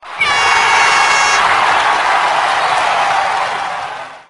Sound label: touchdown